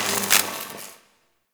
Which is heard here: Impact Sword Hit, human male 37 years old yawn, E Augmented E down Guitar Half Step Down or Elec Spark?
Elec Spark